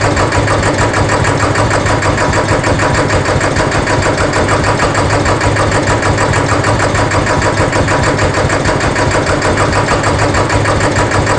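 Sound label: ambience industrial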